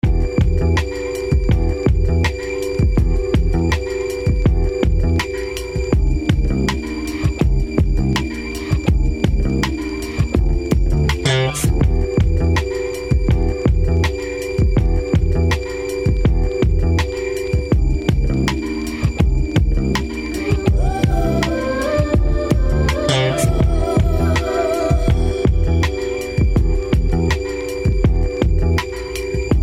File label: Instrumental